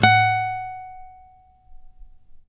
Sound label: ACUSTC F#4, ACOUSTIC guitar; East West; Steve Stevens Guitar Samples Collection; ACOUSTIC PCK